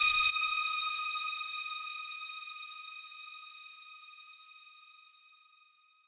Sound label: lead